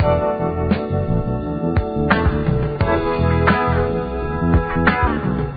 full, Loops Phonky

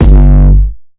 Kicks; Heartbeats

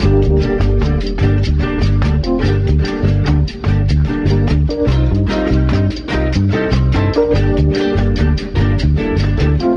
keys; Phonky

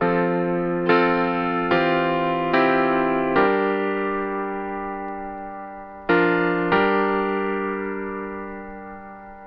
piano, Sad

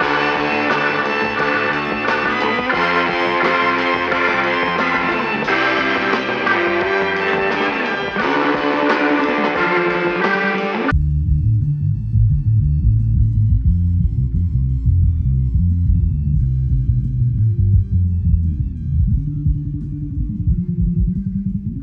horns, phonky loops

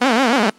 jello fart, zaps and beeps

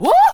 woo; Voice; ooh ahh

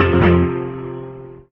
two mexican strums

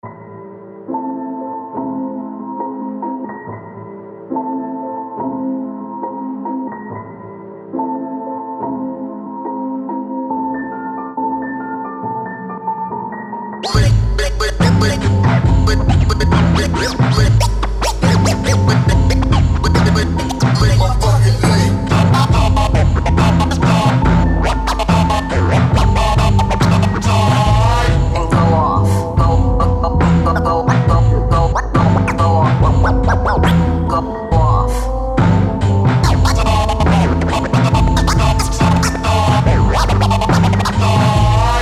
Scratches; Burntablism Volume 2

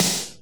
Snares Electro

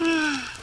ah nongender yawn; Voice; ooh ahh

